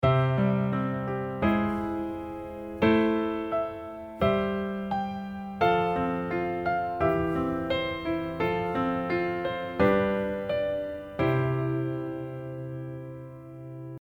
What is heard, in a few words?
longtone pattern